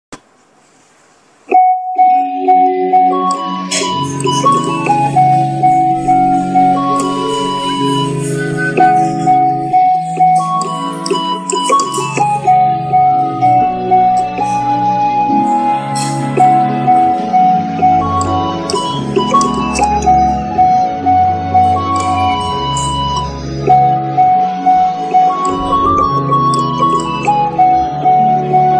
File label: sad bgm; Paagal